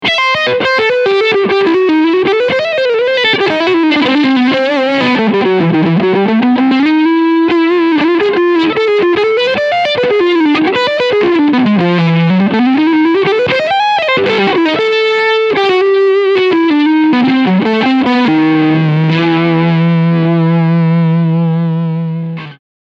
Distortion

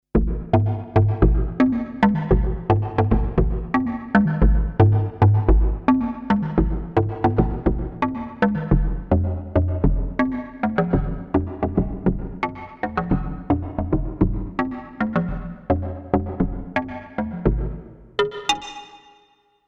Prophet 10 rev4 Logdrum Quantec QRS

